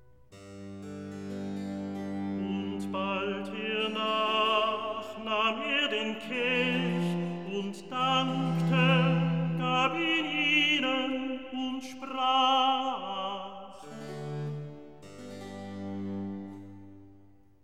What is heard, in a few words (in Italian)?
Recitativo; Und bald hernach; Handel; Brockes Passion